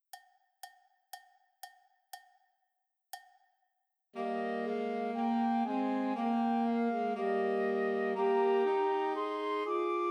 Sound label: Unto Us a Boy is Born, Christmas Trios for Flute Alto Sax and Tenor Sax